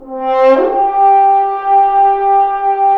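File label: french horns, swells; Roland LCDP06 Brass Sections; F.Horns FX+; FHns Swoops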